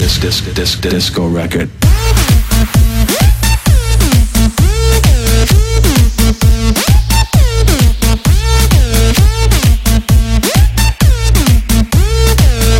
Crazy Music

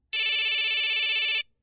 Old School Nokia

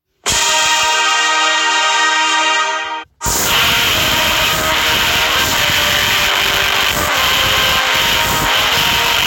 Earrape

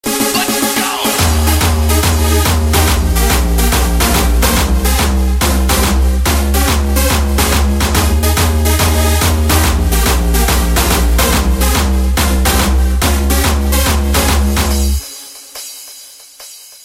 Drum Beats

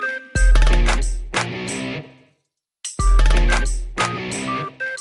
Cool Tune

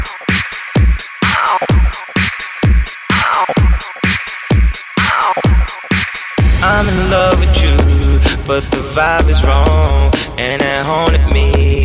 Remix

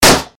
Balloon Pop Sound

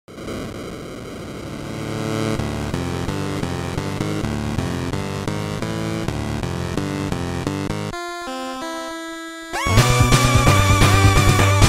8 Bit

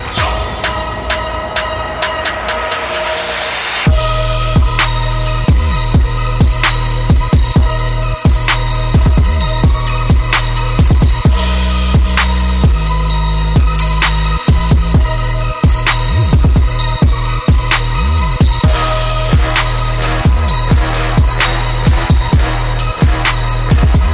trap

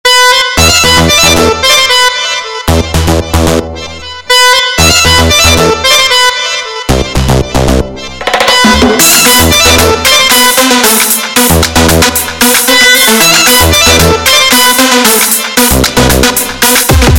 rock

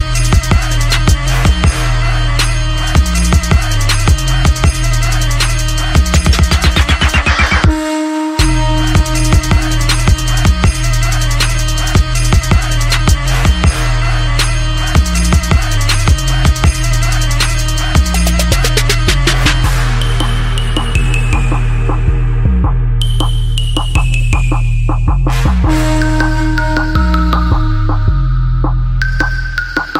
TRAP Mix

